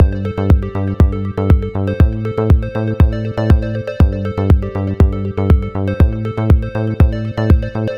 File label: Title Music